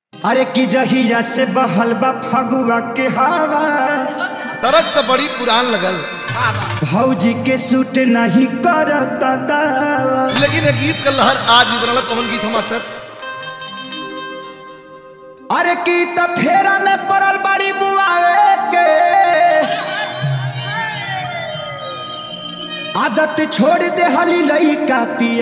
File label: Old Holi Hard Bass Dance Mix